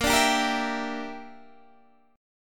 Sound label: A sharp 6th Flat 5th, Guitar Standard; A#6b5 strummed; JGuitar